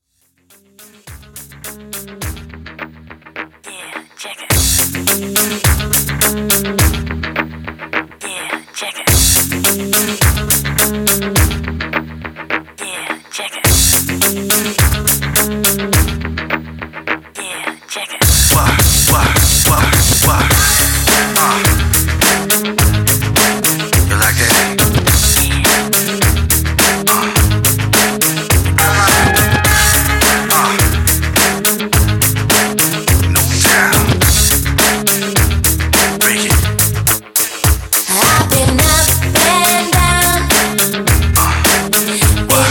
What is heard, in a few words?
Intro 105Bpm Clean